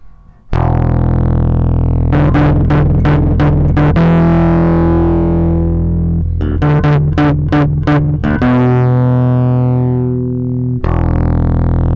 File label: Led Red Center, Over Drive; シンプルなOver Driveの製作